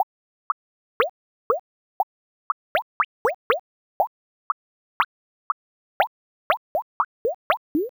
water; ゆめ2っき

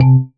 marimba raincoat; ゆめ2っき

